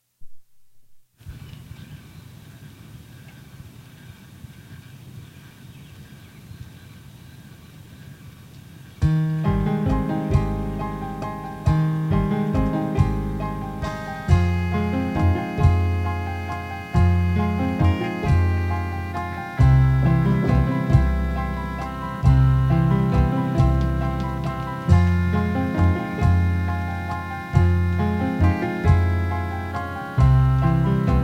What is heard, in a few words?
Variations Waltz